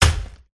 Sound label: boss elf attack contact bottom